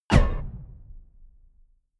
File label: sci-fi ricochet metal; schusswaffen